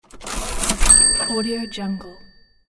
cash register

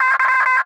morse code y; prosoundfx

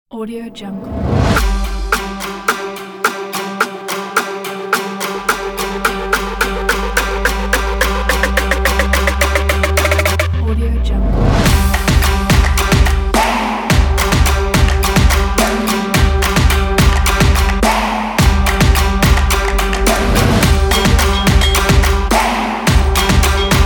stomp strings

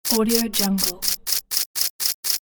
tech cartoon scifi mech motor

